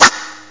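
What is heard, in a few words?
Vinyl Scratch; drums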